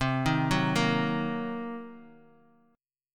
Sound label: C Minor 7th Flat 5th, Bass Standard; Cm7b5 arpeggiated; JGuitar